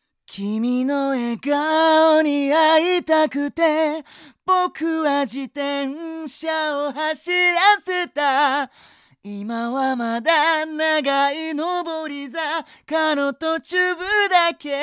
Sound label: sm57 sing